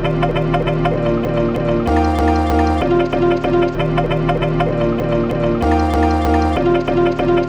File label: granular loop eb maj